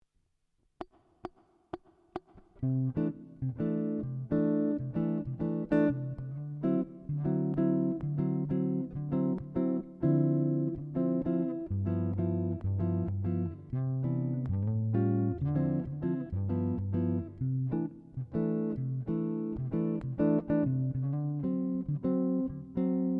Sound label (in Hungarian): jazz improvizacio alap, gitár